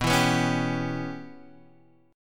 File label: G 6th Flat 5th B